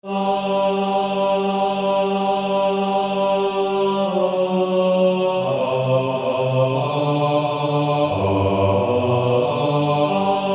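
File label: Male Choir, bass; Onward Christian Soldiers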